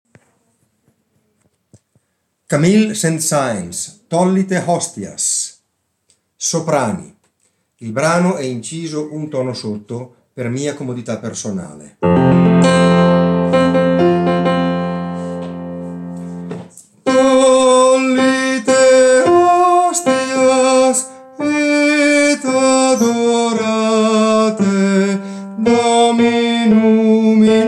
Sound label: soprani; Tollite Hostias